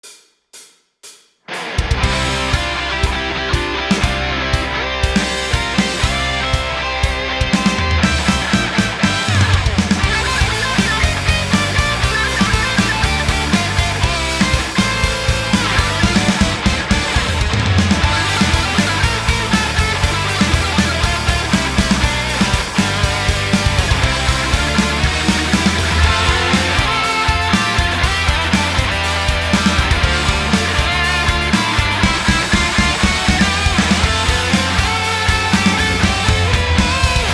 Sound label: Rock Cover